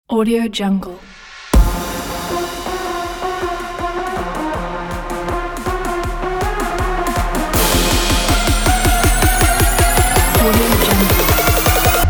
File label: trap